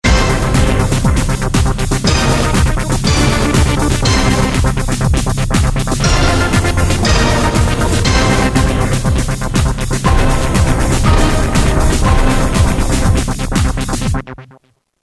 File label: dance again, Roland M-DC1